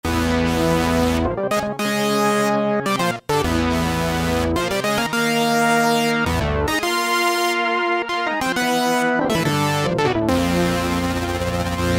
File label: Triad, Roland V-Synth; Factory Patches